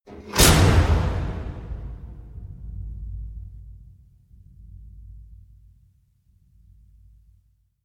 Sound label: slam